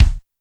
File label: kick, Roland R-70, BD